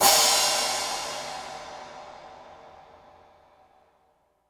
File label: IMP CYMBAL, Cinematic Impact